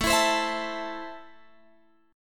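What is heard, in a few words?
A Minor, Guitar Drop D, chord strum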